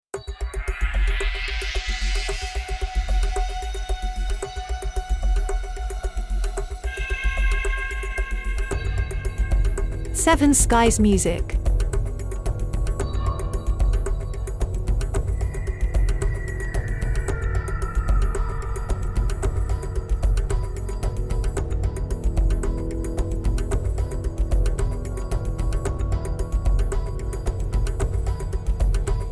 TV drama teaser

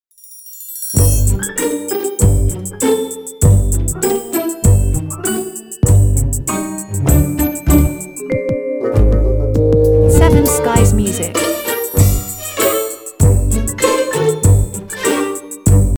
Christmas comedy Stinger

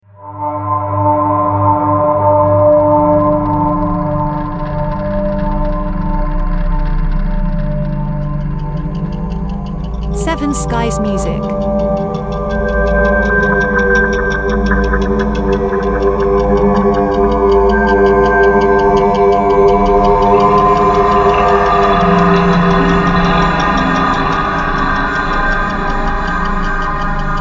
Ambient Dark Film Series

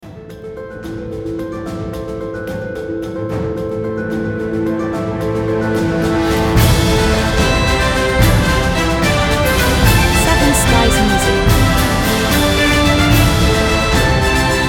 Epic Canto

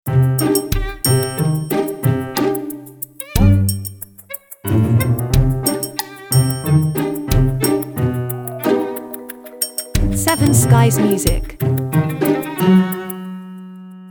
Christmas Violin Fun

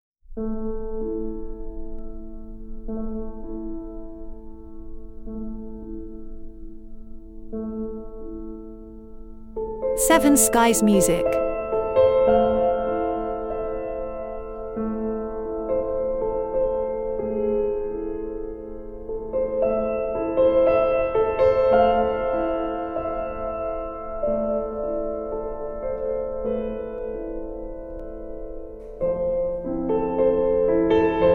solo piano

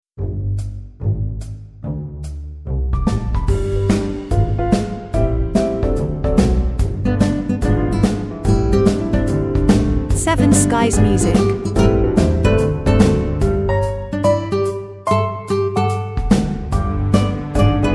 For A Good Laugh

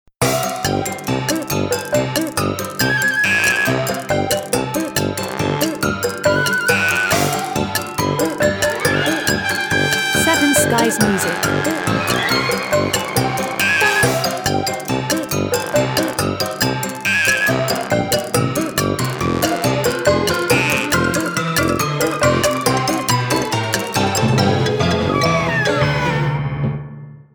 Ragtime

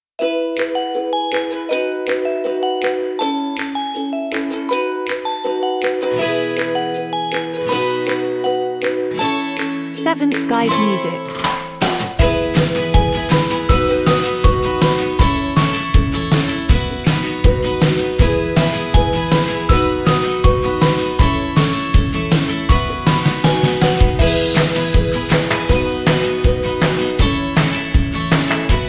Today Is A Gift, instrumental

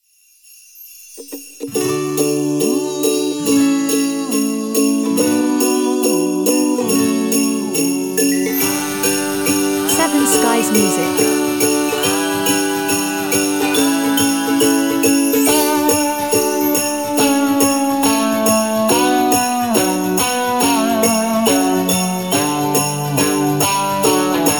Christmas Magic Ukulele - No Drums

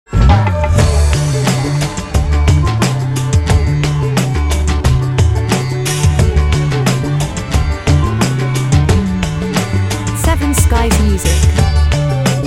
Bollywood

